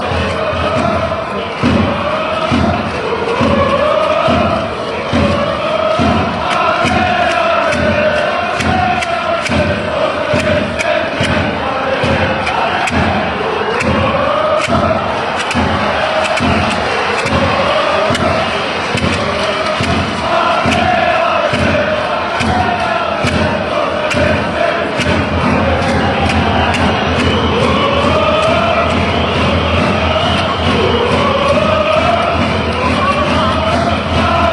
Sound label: forza racing genk allez, fanchants